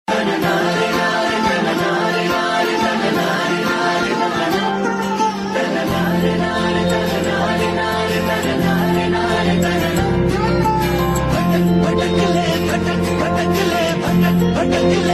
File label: Title Song (Music); TV Serial Tone; Life OK; Baawre Chal Jahan Chalen Mann Ke